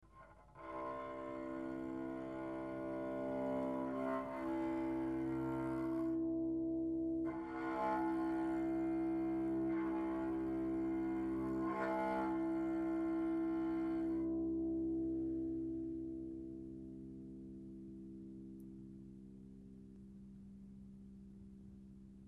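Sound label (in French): archet classique, harpe; touchers de la harpe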